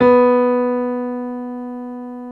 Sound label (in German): klavier h2